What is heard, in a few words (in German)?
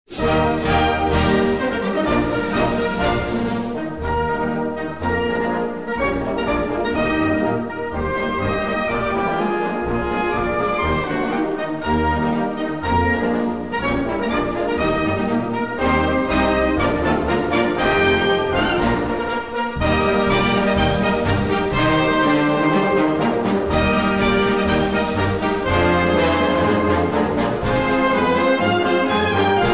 reiter marsch